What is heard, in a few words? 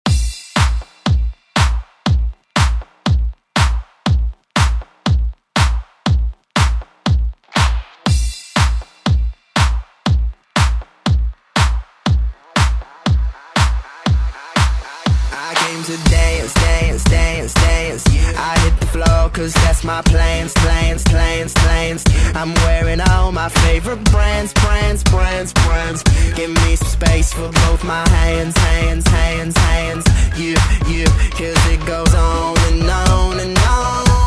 Pop Redrum; Deejay Hit